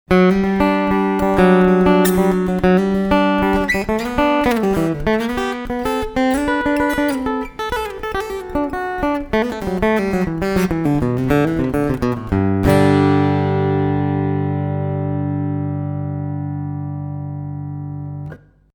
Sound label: TL 6 linear Picking; ESP LTD